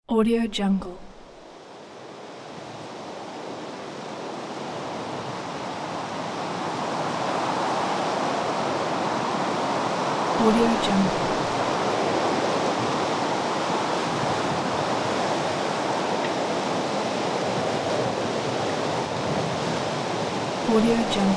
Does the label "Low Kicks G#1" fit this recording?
No